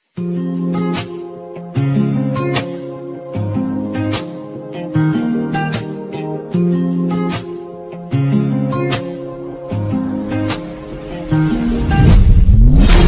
hindi x english ringtone bgm ringtone romantic girls tone